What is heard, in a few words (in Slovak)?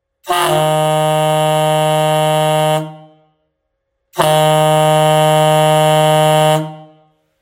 fanfara 740mm chromova hlboky ton bez kompresora